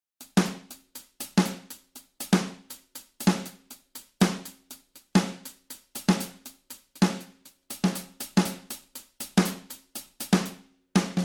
4 4 drum beat

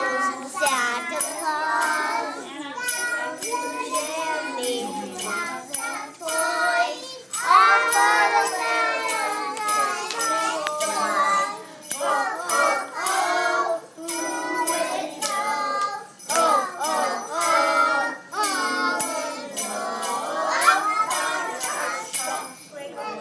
children singing christmas songs

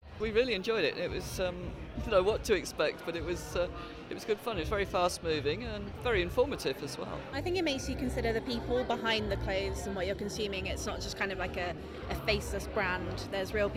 audience reactions; world factory

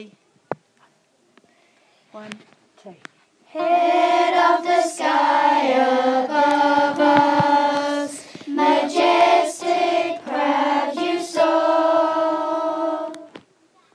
low choir

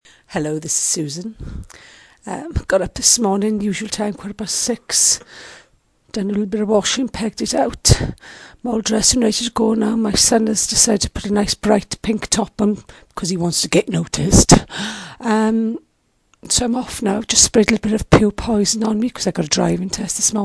upbeat

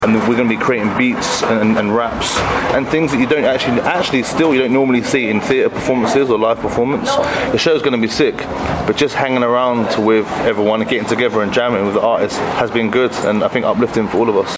beat boxer; mitcham

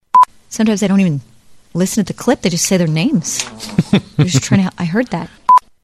farts

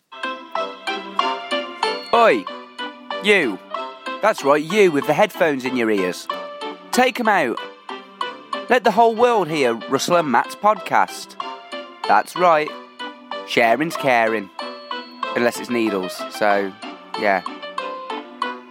jingle

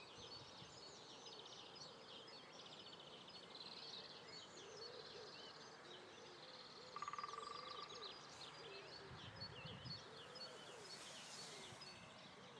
green woodpecker; millennium green; enjoying the sun

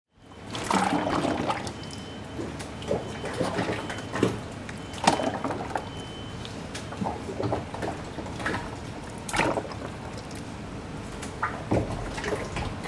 gloopy wallpaper ink

